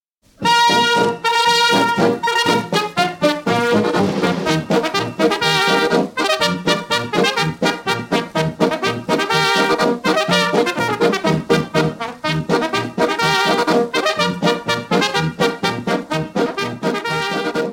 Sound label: Polka; Allemagne